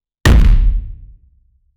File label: person with immense stren